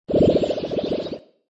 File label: Scifi Chemical Reaction; Scifi Production Element - Chemical Reaction